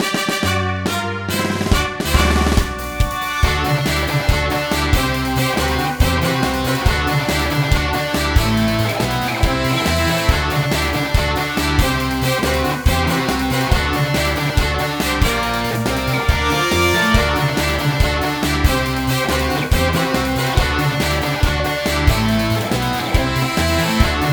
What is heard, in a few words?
Fanfare; Final Fantasy 6 Remastered